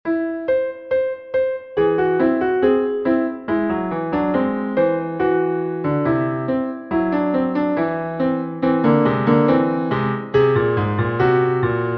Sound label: Whole Tone Canon